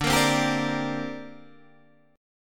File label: D 7th Suspended 4th Sharp 5th, D, down, Guitar Standard; strummed; JGuitar